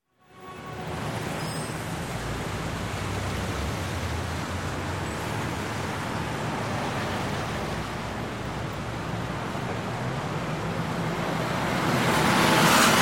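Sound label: Medium Traffic, Horns, Voices, Siren Passes By; Sound Ideas 2000 Series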